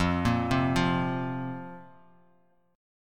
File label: F Suspended 4th, chord; Fsus4 arpeggiated; 13,13,10,10; JGuitar